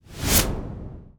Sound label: UI Transition Woosh